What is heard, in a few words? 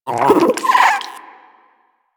vocal idle, critters; Warframe; Hunting Critters; Nexifera